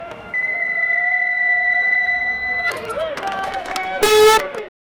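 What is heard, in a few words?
f1 race start beeping tone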